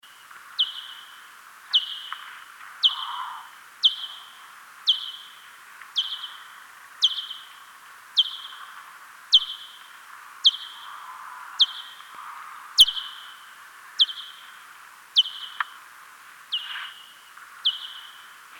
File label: Chalinolobus gouldii